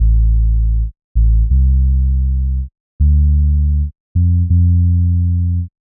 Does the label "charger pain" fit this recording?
No